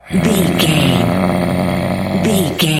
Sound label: voice male growl